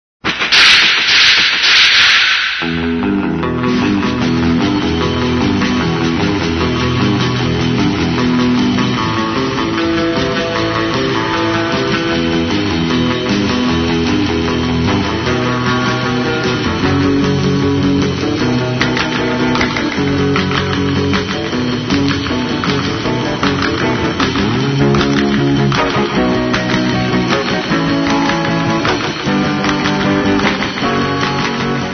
gunfire

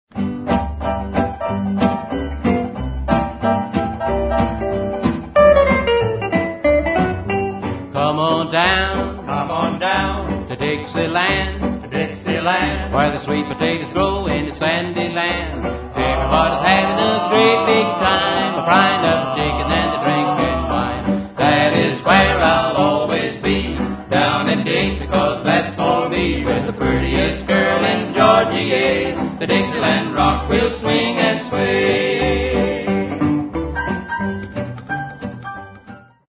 dixieland

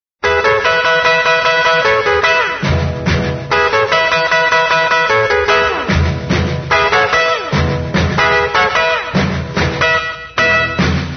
guitar boogie rumble

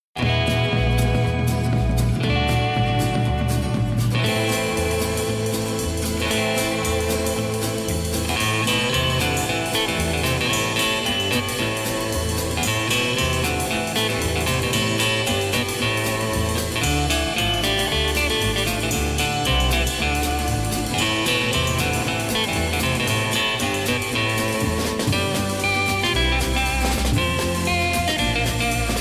Rumble

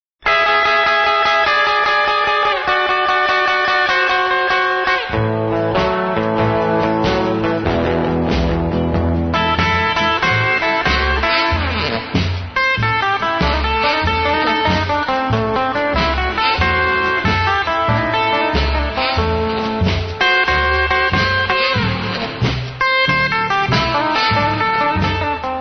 cajun blues

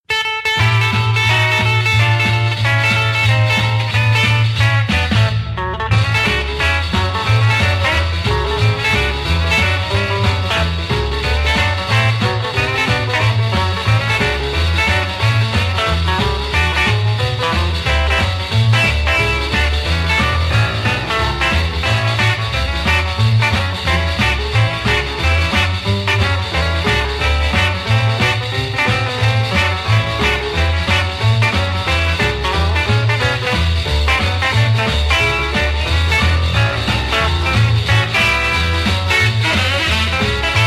electric guitar boogie

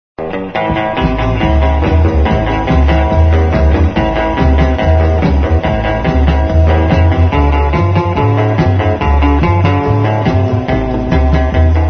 wire guitar

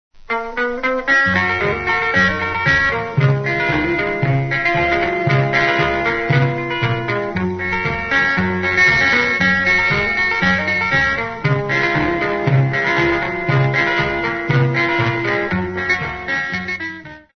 guitar led

